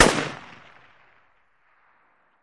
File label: Ak47 Sngl Shot